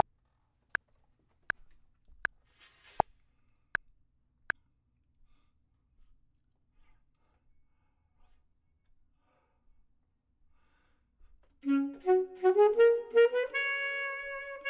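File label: bottom, Saxophone and Clarinet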